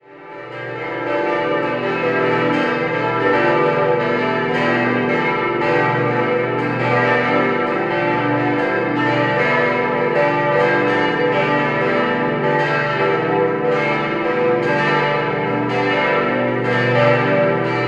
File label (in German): Glocken, Augsburg-Hochzoll, Pfarrkirche Heilig Geist; Der Stadtteil Hochzoll liegt im Osten Augsburgs